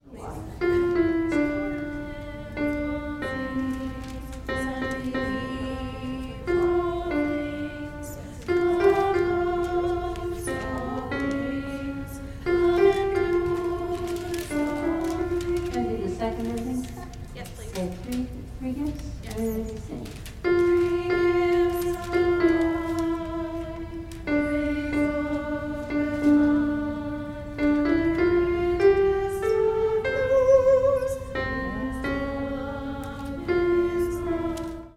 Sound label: Love Endures All Things alto